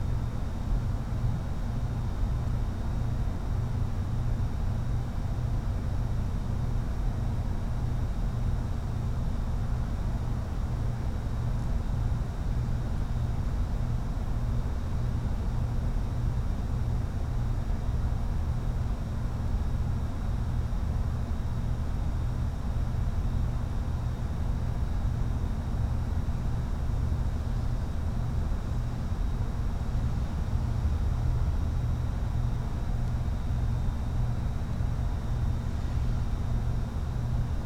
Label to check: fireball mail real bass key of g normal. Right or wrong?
Wrong